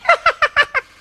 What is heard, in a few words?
hunter pain; huggy wuggy snpc; elmo